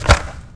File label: land dirt; terrains; Tony Hawk's Project 8; XBOX